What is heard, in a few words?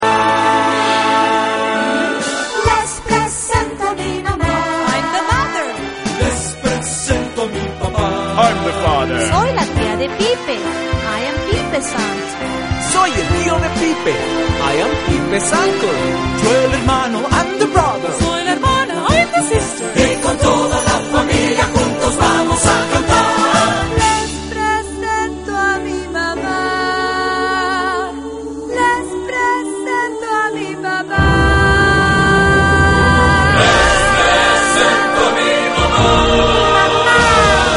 pipe